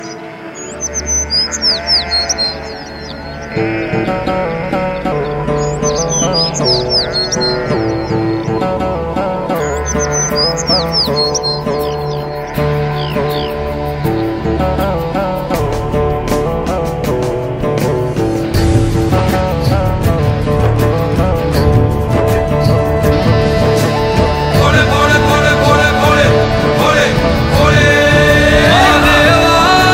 Instrumental Music Ringtone

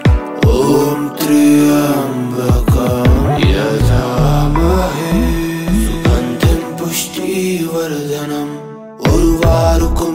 Om Triyambakam Yajamahe Slow With Music Ringtone

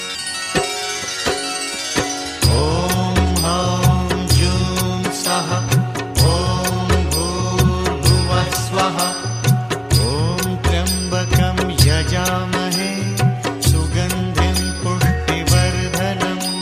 maha mrityunjaya mantra ringtone

